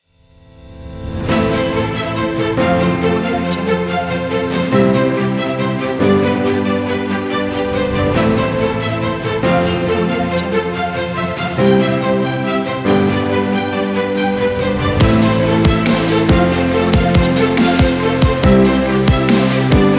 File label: cinematic dubstep